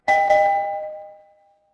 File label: door doorbell